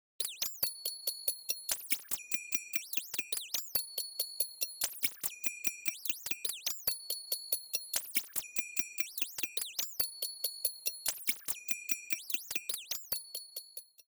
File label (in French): Stem 5, version « Mix », avant égalisation; L'égalisation sera statique et tentera de redonner corps et naturel au son